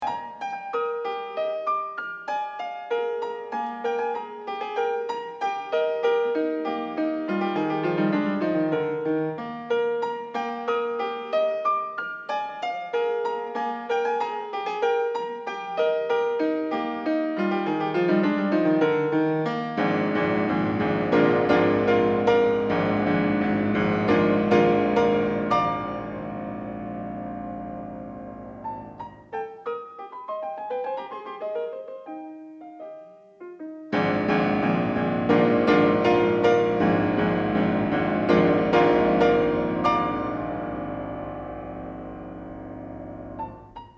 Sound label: Variations For Piano